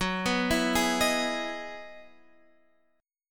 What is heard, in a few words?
E Major 9th F sharp, chord; arpeggiated; JGuitar